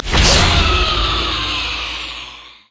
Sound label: spectral; LoL; yorick